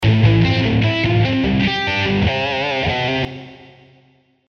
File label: arpeggio, guitar; guitar arpeggios part 1